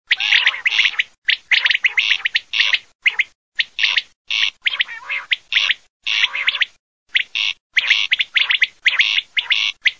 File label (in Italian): QUAGLIA